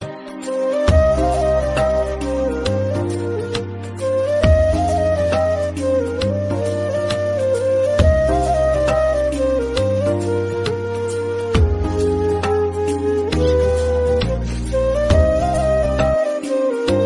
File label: Love Music Ringtone